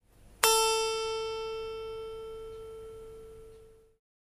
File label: Guitar